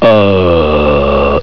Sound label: dinosaur